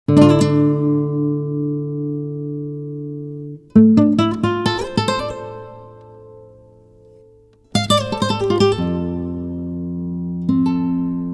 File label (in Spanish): guitarra